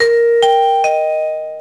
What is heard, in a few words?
sound chimes